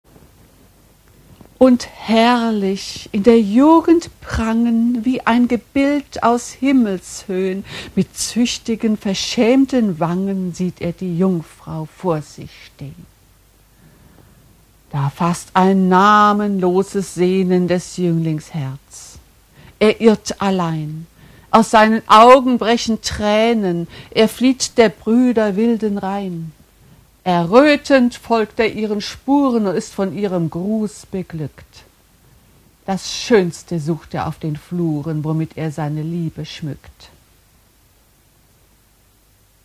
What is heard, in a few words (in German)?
glocke